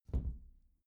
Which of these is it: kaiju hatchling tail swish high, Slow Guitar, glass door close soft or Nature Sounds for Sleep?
glass door close soft